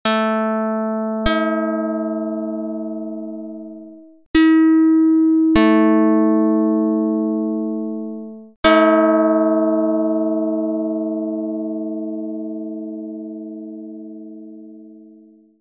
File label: pluck; dr220